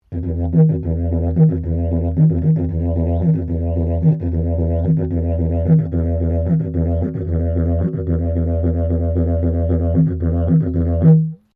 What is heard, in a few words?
Didgeridoo Traditional Tapered Yonglu Drone, Traditional Australian Aborigine didgeridoo; Traditional Australian Aborigine didgeridoo designs; Miscellaneous Musical; SoundEffects Foundation2